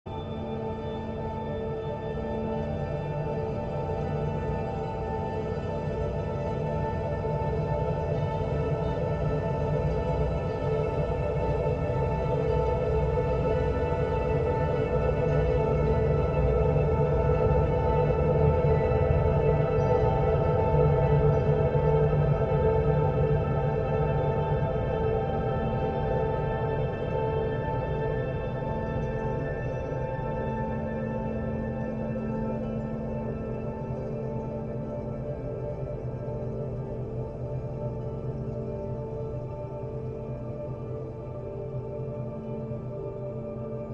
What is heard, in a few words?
tone